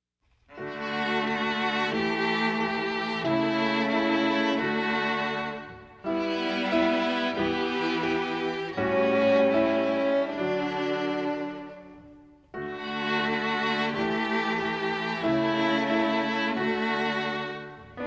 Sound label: music only